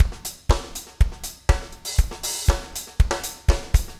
4 on floor 120, loops, 120bpm

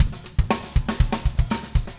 funk, 120bpm, loops

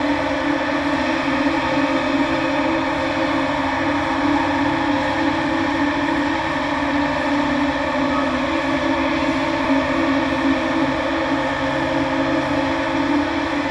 Psycho Drone; Dance; SFX

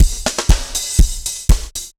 dance floor tight 120, loops; 120bpm

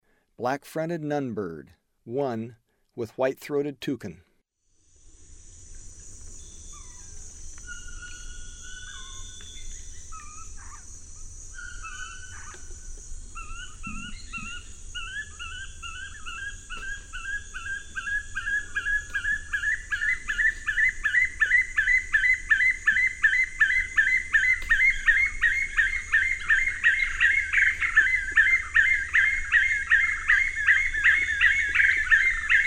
Black-fronted Nunbird; S. America; Eastern Lowlands

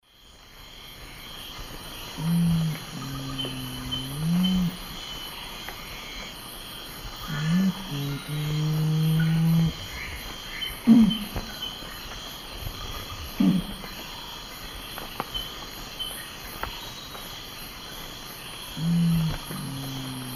Nothocrax urumutum, song; S. America; Eastern Lowlands

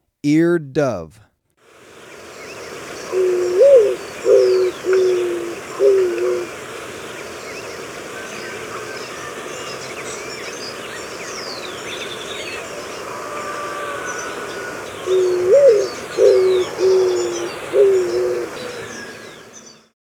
Eared Dove, Birds of Ecuador; S. America

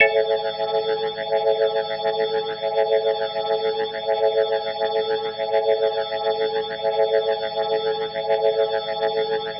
Glowing Organ A Maj7 and 8va; Major 7; The Vitale Organ

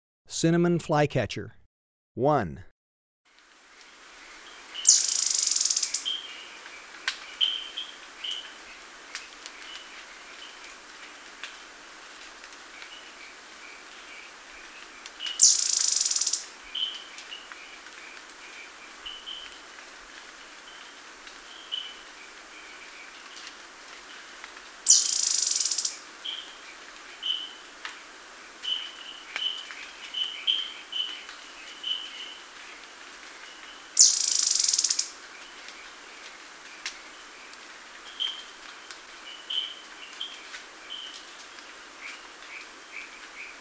Cinnamon Flycatcher, Birds of Ecuador; S. America

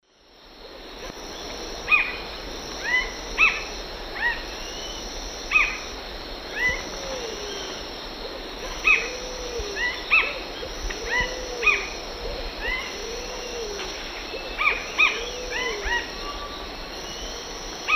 Synallaxis gujanensis, songs 2 birds; S. America; Eastern Lowlands

